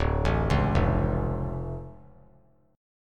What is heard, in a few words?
D Minor Major 7th, D; 2,4,4,3; DmM7 arpeggiated; JGuitar